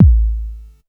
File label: bass drum deep, techno trance drums